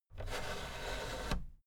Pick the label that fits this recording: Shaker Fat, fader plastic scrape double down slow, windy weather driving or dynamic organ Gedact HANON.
fader plastic scrape double down slow